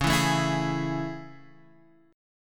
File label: A Major 7th C sharp